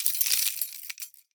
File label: link, mg42; svencoop; ins2